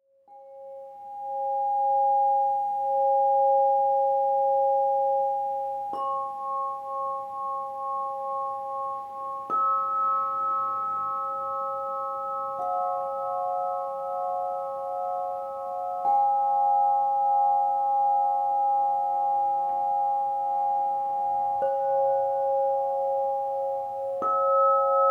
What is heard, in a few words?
Lotus C Travel, crystal harp